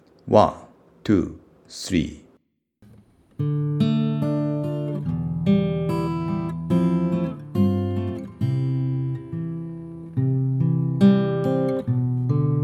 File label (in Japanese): ギター伴奏; 30秒